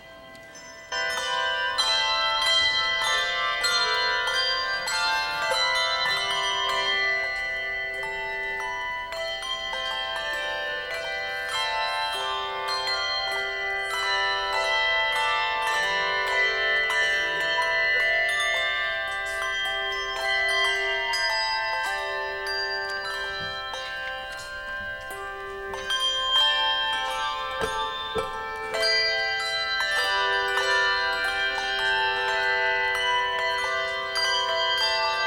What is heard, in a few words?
Anthem, Carillons